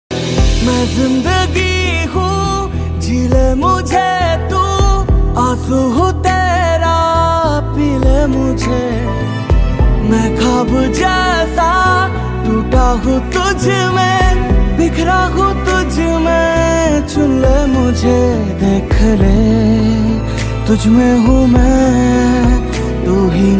Hindi Love Song

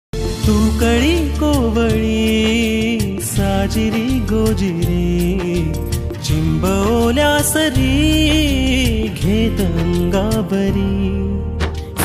Marathi

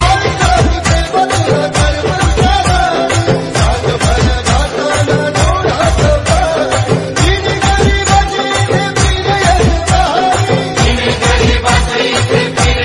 Bhakti Ringtone

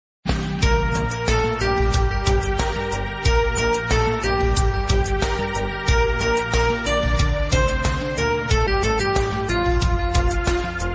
Instrumental Song

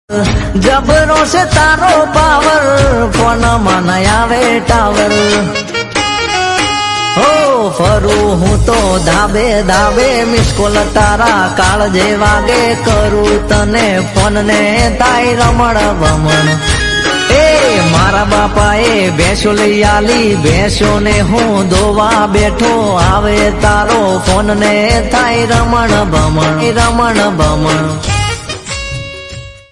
Gujrati Ringtone

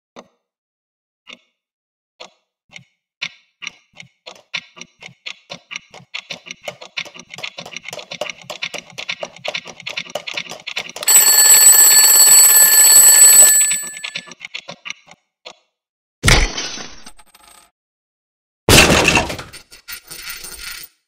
Animated Cartoon Clock Alarm Ringtone; ringtones

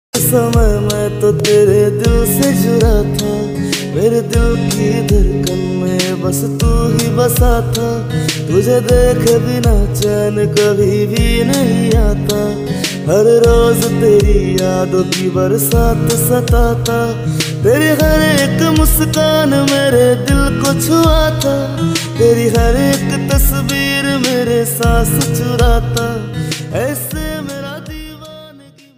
Hindi Version Ringtone

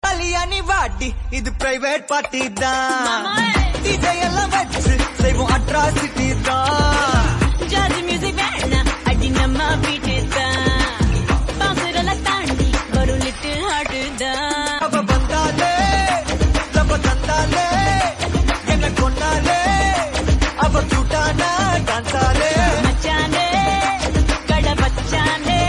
Party Song Ringtone

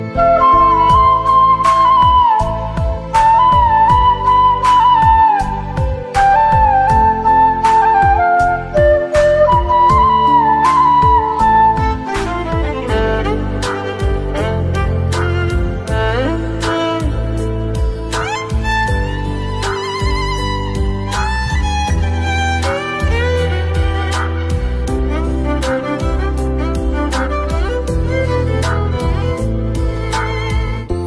Best Instrumental Flute Ringtone

